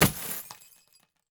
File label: Crashes Light Bulb Debris Falling On Plastic Tub; AdobeCC